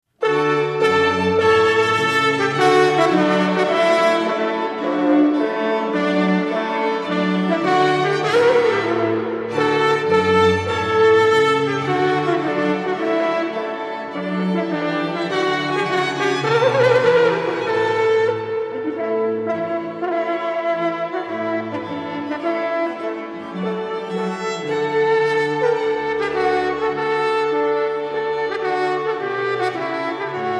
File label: Trumpet Tone; Purcell